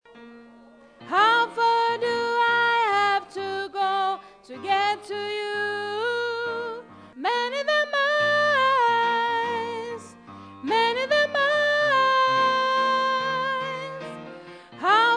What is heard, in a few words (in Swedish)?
bas